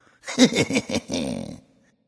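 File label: "heh heh", nonverbal; smite; voicepack; khepri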